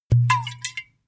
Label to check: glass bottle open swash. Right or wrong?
Right